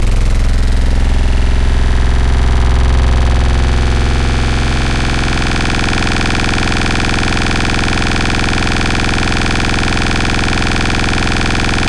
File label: Growl Ugh; Synthetic's Serum Collection; Echo Sound Works Core Tables